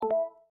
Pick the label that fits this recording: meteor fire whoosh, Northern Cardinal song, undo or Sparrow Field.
undo